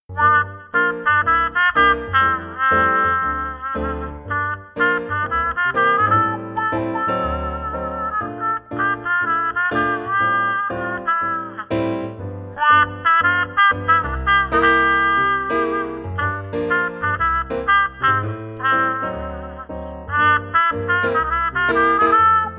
Petite trompette bouchee; Porta Picta inauguration du portail peint

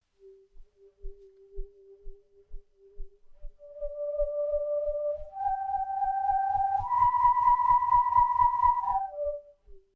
Tube tourne son; Vent; Duperrex

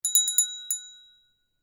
Son Cloche aluminium; Percu

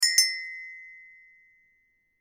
Son Cloche Inde; Percu

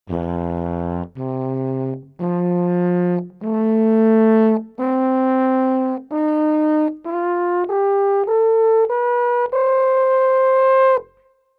Gamme Alphorn son

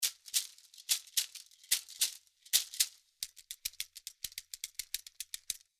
Djabara son; Percu

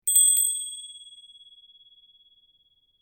son Cloche; Percu; Cloche 11; Duperrex

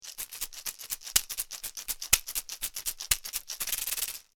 son Maracas Waiwai; Percu; Duperrex

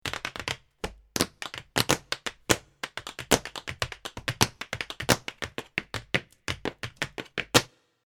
Son Claquettes; Percu

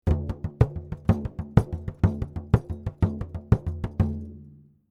Ravanne son; Percu

